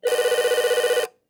TELEFONILLO CALLE; Yesterday Origins; Switch, PS4, PC, iOS, macOS

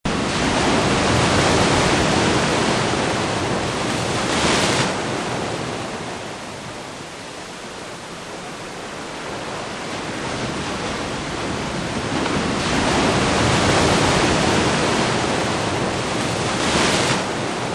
Olas (Ambiente); Abrapalabra La Magia de Aprender a Leer

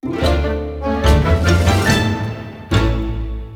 Fanfarria Inicial; magic twins

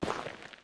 Paso tierra; Yesterday Origins; Switch, PS4, PC, iOS, macOS

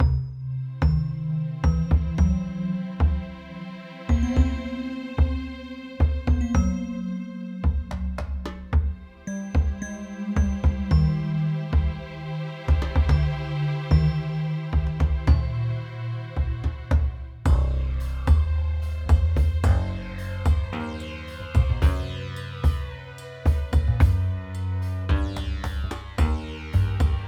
music desierto; lootlite

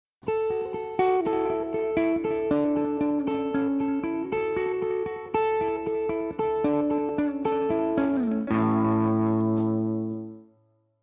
ELECTRICA SOLA

